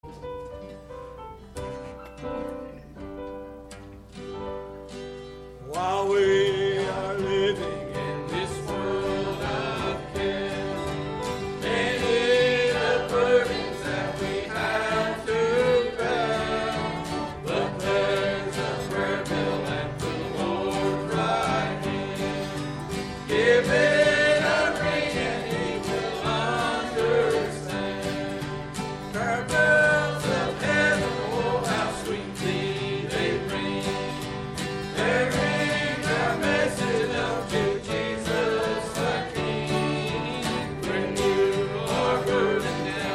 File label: Choir; Prayer Bells Of Heaven